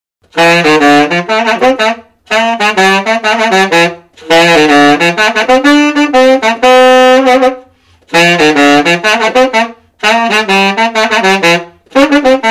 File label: Top Hat Queen Bee II, Sax